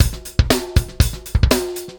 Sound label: ACID ROCK